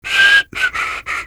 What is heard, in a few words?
fish whistling, fisherman; lostcoast